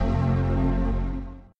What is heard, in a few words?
unlock